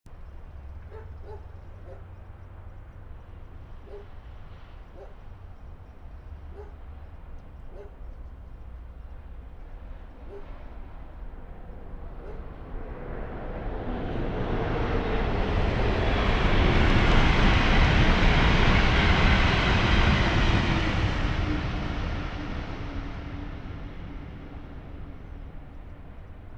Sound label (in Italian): Treno; Eigenmike; ComunePR; NuovaStradaF